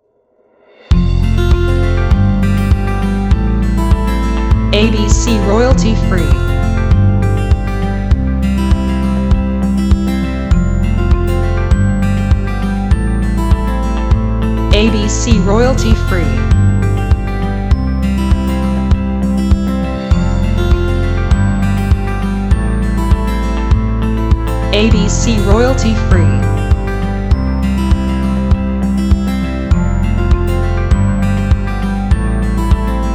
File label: Acoustic